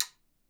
HIT, Percussion; Miroslav Vitous - Percussion & Harp

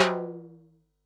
tom, Pearl SC-40 Toms

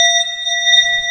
bell, 3D Overtone Synth; E-MU Producer Series Vol. 5 – 3-D Audio Collection; 3DSprints

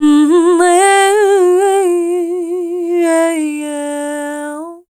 FEMALE, Dance Vocals

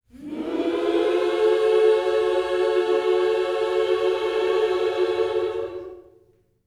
MINOR, MIN.SCOOPS, Vocal Planet, Gospel

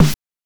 BANK1 SD, NES DPCM; MIDINES

